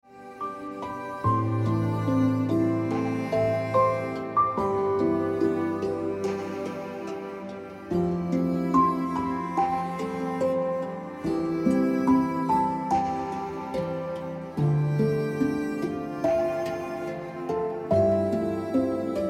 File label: Ringtone Piano Music